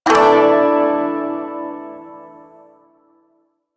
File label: Shawzin Pent Maj Chord C, Music; Chords